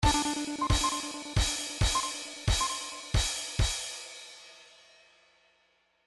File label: trance midi loops fills sfx; Newtronic Trance Constuction Kit